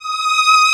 ST C5, SYNTHESIZER ANA STRINGS; AKAI S6000 CD-ROM - Volume 1

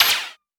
snare lifeforms snare; Polyend; Recue

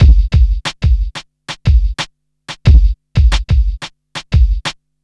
Smooth, R&B Drums

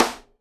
SIDE STICK, Live Snares

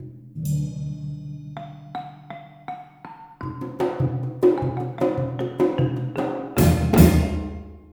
MALAY PHR L, Heart of Asia; Spectrasonics - Heart of Asia CD2

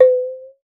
WATER; Xsample VOL-9

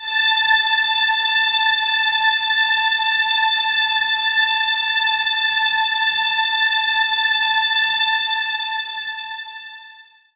string; Techno Trance Essentials

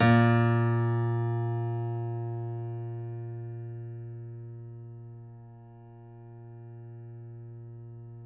piano; East West; The Ultimate Piano Collection

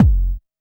KICK deep, Techno Trance DRUMS

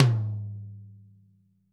TT, Toms; Bob Clearmountain Drums I

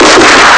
Explosion; ST-XX Amiga Soundtracker Sample Packs

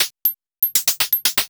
POLYMOD; AMG - Vince Clarke Lucky Bastard

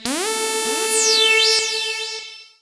lead syn, Techno Trance

